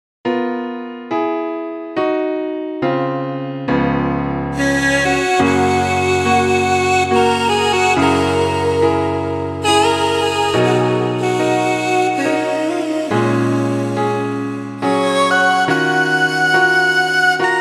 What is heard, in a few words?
Amazing Air Sax